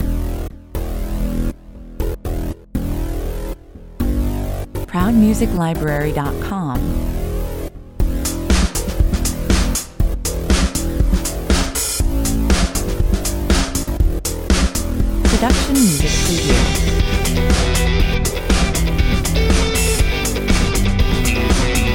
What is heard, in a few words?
saturday night moves 120 bpm